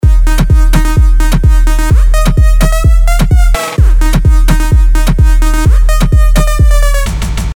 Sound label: Sylenth1 dirty dutch leads; Golden Era; revealed sylenth1 dirty dutch leads vol 1